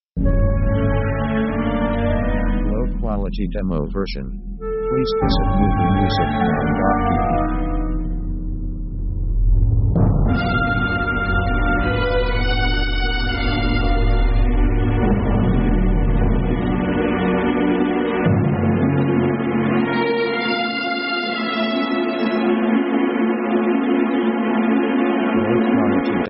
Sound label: orc danger